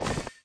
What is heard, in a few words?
dream platform appear